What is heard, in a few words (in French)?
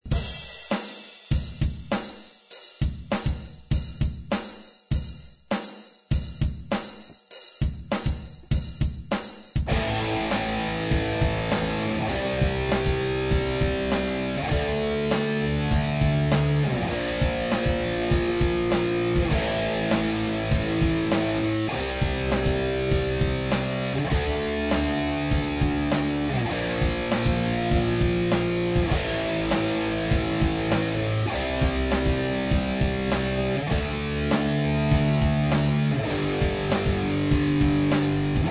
Power chords débutants